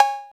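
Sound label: R8 cowbell; X-Static Goldmine 1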